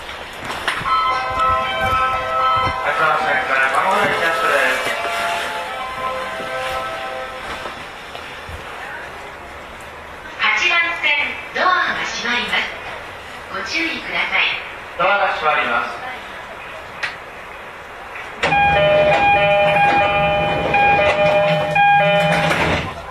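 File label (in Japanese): E231系 高崎線直通普通電車, 走行音; 大崎-恵比寿; Shounan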